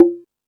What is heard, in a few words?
Jungel Percussion, E-MU Pro-Cussion; drum machine